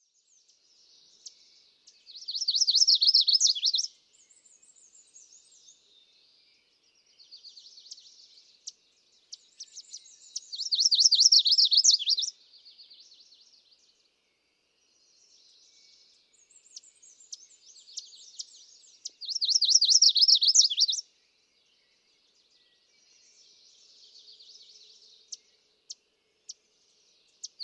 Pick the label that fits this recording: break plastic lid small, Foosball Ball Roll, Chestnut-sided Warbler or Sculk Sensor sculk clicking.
Chestnut-sided Warbler